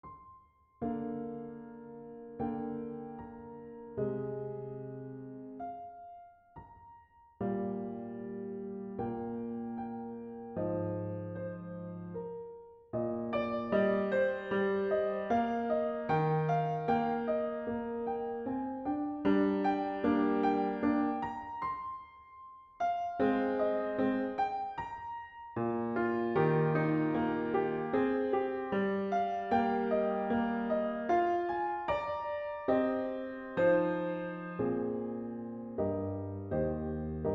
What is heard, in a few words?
Contemplation